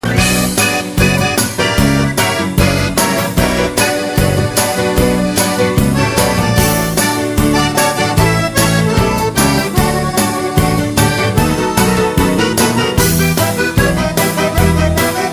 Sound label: instrumental